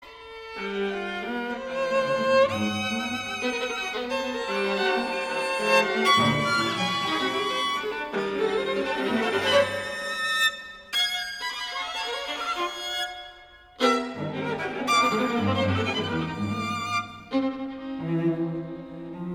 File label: string quartet